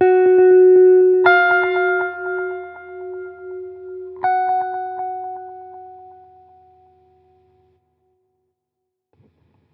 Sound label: One shot, guitar; guitar vibes